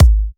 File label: G#, kicks; markus hakala kicks